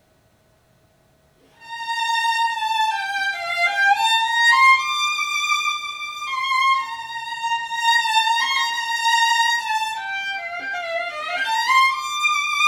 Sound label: violins; Gamberini